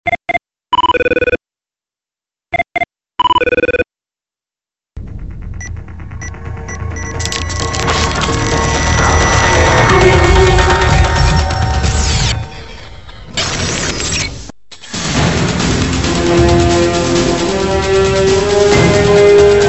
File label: ringtone Nokia 6230i; 24 hours CTU mix